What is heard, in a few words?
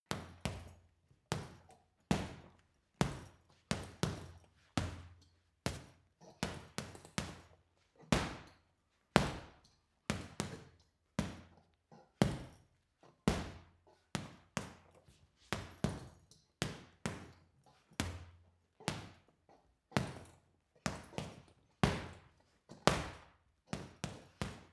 boxing punching bag boxing sequence; sport-freizeit; boxen